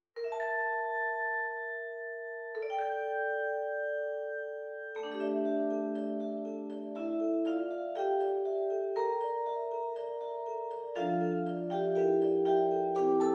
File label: Cheer Music box